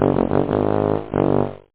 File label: Tuba; 250 Desktop Themes